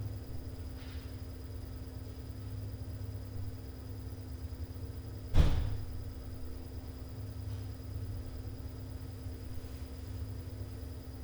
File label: STREET DOOR SMASING, Night Noise